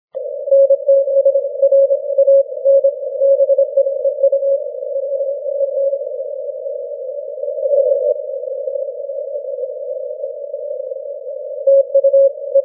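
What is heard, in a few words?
40m continuous wave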